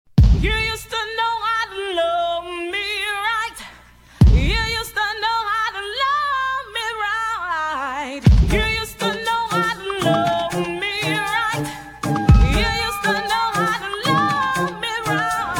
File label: reggae